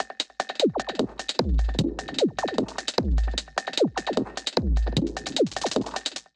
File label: Kit groove, 151 bpm; MIDI Clips; 133 - 151 bpm; Service Module Kit; Ableton; Glitch and Wash